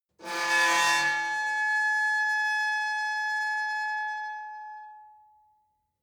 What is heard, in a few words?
metal squeal saturated intense; industrie-wirtschaft